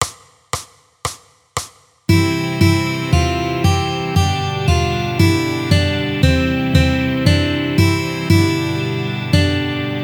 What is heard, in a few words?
exercise ode to joy fast, guitar